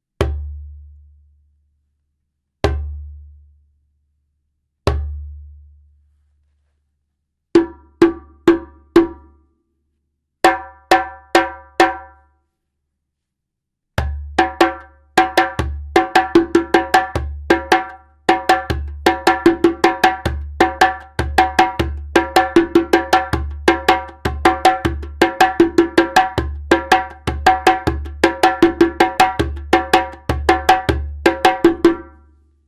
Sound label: djembe